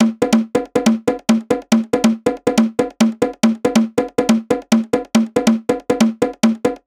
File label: Bongo